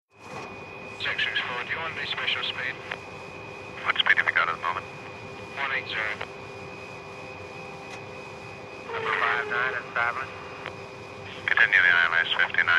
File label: 707 Cockpit Landing, interior perspective